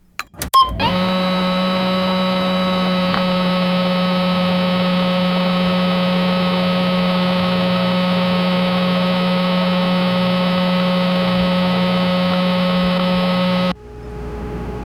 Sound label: the computer startup soun